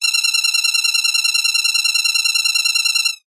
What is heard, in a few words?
science fiction computer alarm incoming threat